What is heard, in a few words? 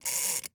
Electric Timer Turn; Clocks, Watches and Timers; Blastwave